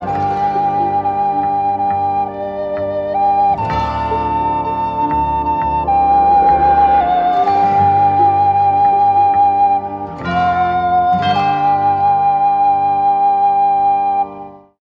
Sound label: flute, Loops